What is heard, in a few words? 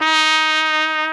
SOLI TRP, Alpine Volksmusik, TROMPETEN